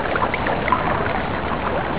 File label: water